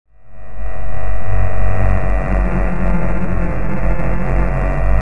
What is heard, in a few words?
Noise; Revista CD Expert 50